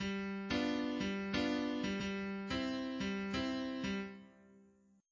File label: swing